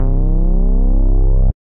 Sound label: Bass; MiniMeemee Bass; Operator